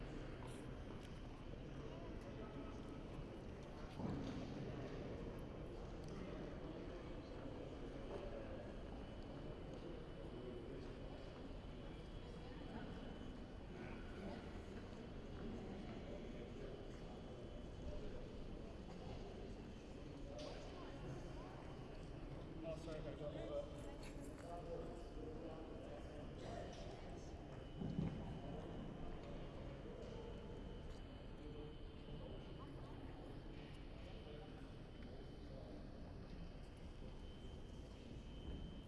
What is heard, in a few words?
Urban Large Building Entrance OUT Stereo Binaural